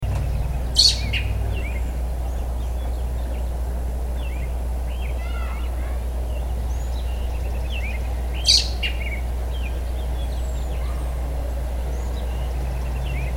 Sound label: eastern red squirrel chuckchuck